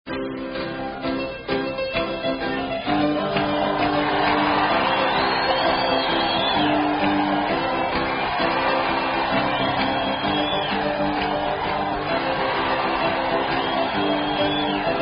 Piano

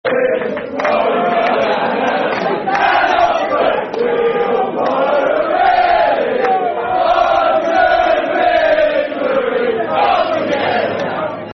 Football Chants (Soccer Songs); We All Follow The Reading; Reading Rfc

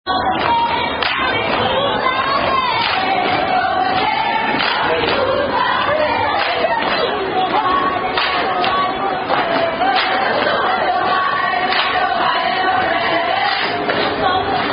Zeta Phi Beta Sorority Song; Zeta Power

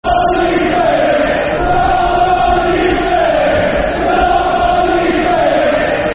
Come On You Saints, Football Chant, Soccer Song; St Mirren; St Mirren Smfc

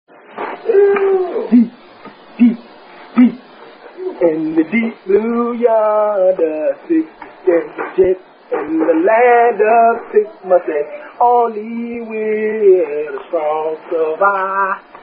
Chant; Sigma Rhomeo Chant; Aka Deep In My Heart And Soul

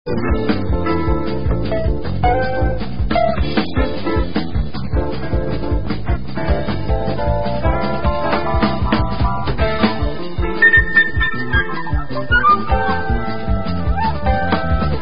Instrumental

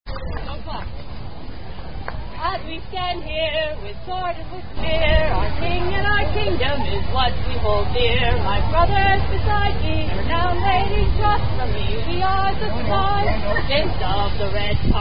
SCA Song

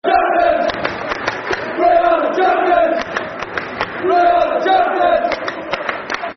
Football Chant (Soccer Song), Sunderland; Bring On The Champions; Sunderland Safc

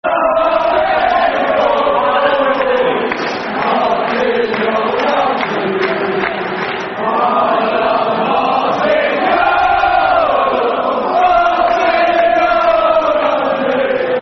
Football Chants (Soccer Song); I Love Martin Jol; Tottenham Hotspur Thfc

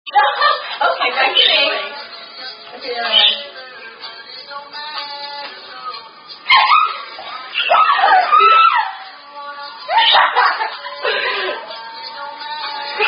Drinking Game; Signs Drinking Game; Related To Thumper

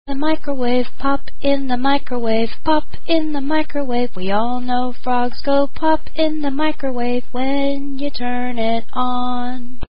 Frogs Go Pop

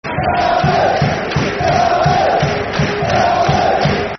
Red Army, Football Chant (Soccer Song); Charlton Athletic Cafc

